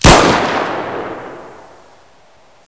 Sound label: deagle; cstrike; DEX